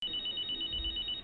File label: nokia 1610 ringtones ringtone